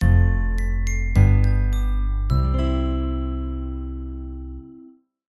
multimedia signatue ident warm sincere piano guitar bass glock; zapsplat